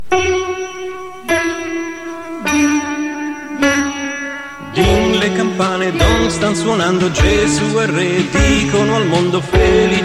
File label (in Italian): Ding le campane